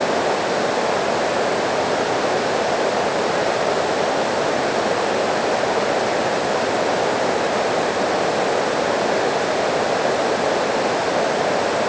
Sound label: Speech shaped noise